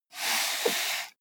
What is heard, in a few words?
computer mouse draw long